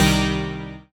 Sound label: Metal Chord C3; Novation LaunchPad Mini MK2; ableton; Launchpad demo project 2014